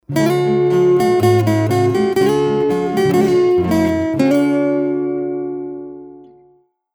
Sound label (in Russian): gitarist gimn ukrainy sms ringtone